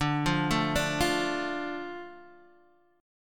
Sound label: D Minor D Guitar Open D